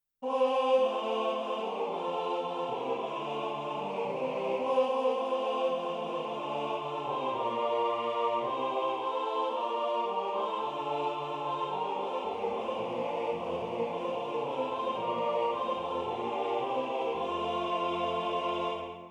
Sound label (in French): Au dela des cedres tutti, Chants